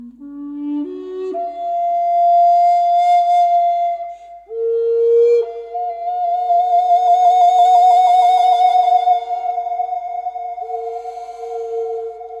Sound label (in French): effet papillon, flute a bec